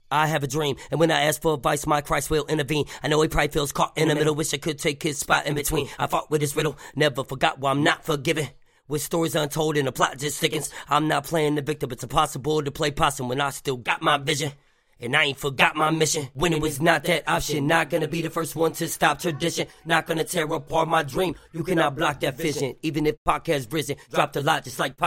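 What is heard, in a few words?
hip hop 140 AM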